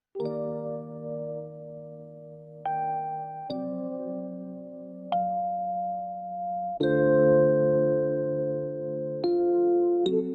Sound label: rainy lofi